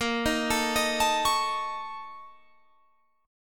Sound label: A sharp Minor Major 7th Double Flat 5th A sharp